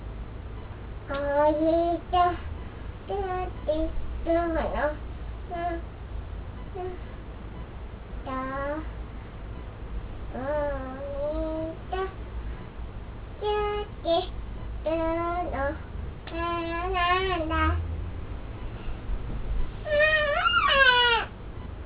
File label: song tulip